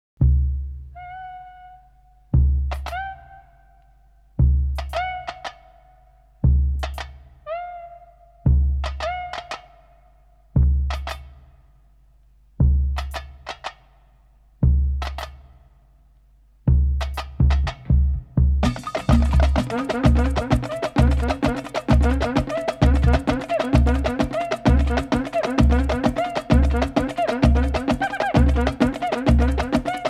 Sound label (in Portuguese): Batucada